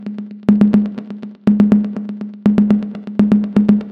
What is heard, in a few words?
122 BPM Toms, percussion; percussion essentials 1